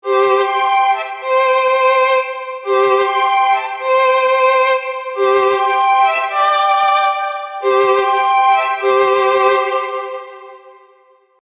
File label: Irish Melody